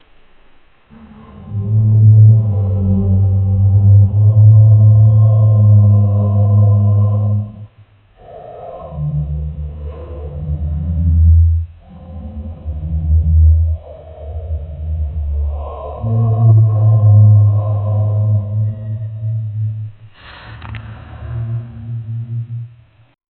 knights castle ambient

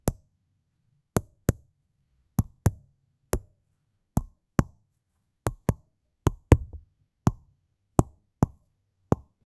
light hollow bounce of a, tennis

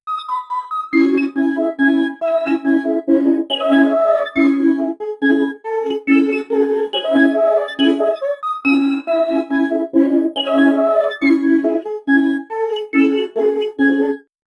upbeat playful synth melo; bloops

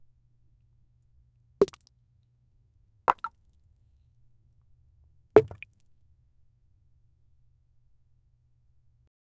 rock drop on the ground; earth

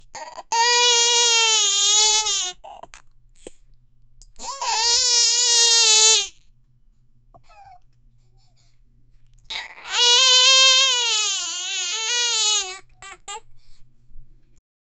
crying baby mouse; mammals

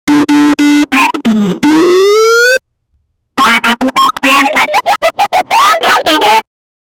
human voice funny reactio; human voice funny reaction

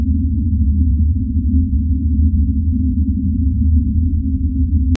deep underwater ambience

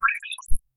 western ui transition sou

